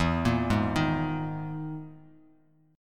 E Major 7th Flat 5th down Bass Standard; EM7b5 arpeggiated; JGuitar

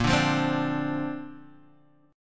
A sharp Major Add 9th, Guitar Half Step Down

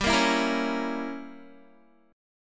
G Diminished 7th, Guitar; Gdim7 strummed; 14,13,16,15,13,x

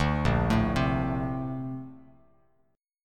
A sharp Major 9th, Bass Standard; arpeggiated

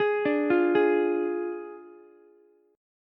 D Diminished D, Ukulele; arpeggiated

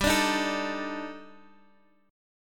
Eb Major 7th Flat 5th G

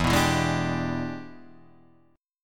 E 7th Sharp 9th Guitar Open D, chord

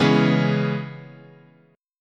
Db Minor 7th, chord

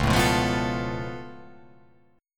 C sharp Augmented 7th, C sharp, down

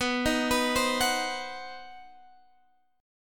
C Minor Major 7th Flat 5th B, chord

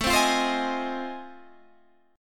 F 7th Sharp 9th

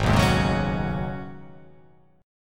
G sharp Minor 11th G sharp, chord

